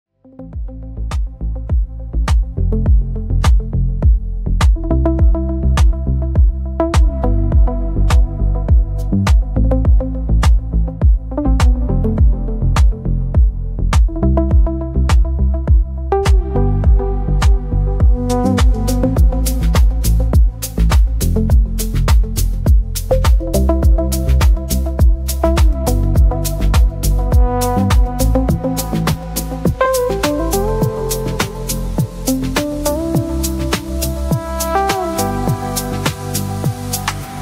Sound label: Drifting Waves, بی کلام دیپ هاوس